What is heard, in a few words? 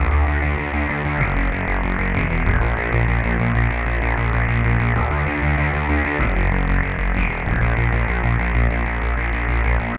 seq, drum n bass keys pads; Sonic Foundry (Sony Creative Software); Knutrix Drum & Bass; Keys Pads 2